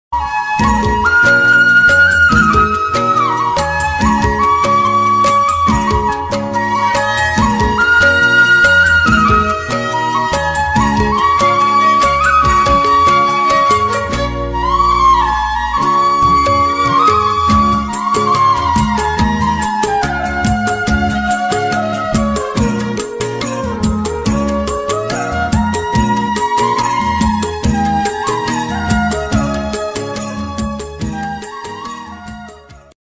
nepali ringtone, nepali ringing tone song, nepali bgm ringtone song